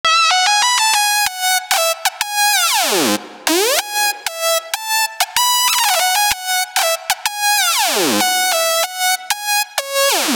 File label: Funky Lead 95 G-m Tail